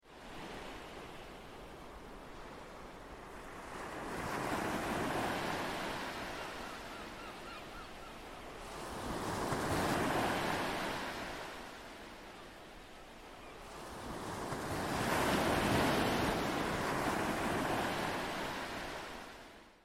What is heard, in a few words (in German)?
OceanBox Sound Beispiel; waves; relaxound